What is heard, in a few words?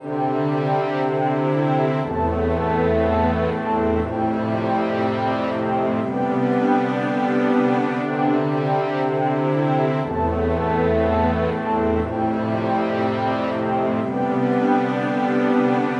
Orchestra; BBC